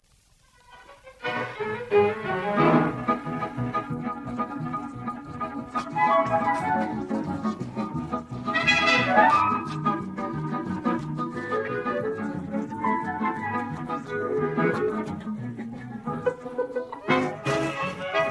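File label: camp songs, backing track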